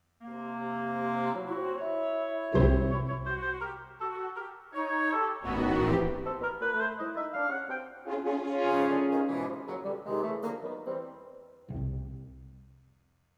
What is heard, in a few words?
Pondering Woodwinds